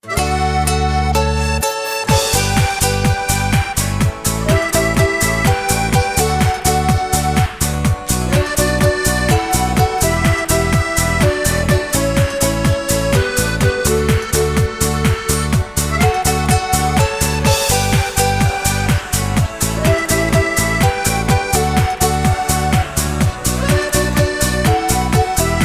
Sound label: DUET, SINGBACK, BULGARIAN